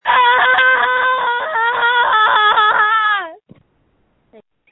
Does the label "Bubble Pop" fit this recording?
No